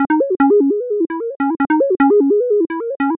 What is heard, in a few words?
150 C#m, Melodic Loops; M8; Electronisounds